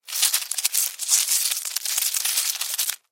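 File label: cockroach voice; tarakan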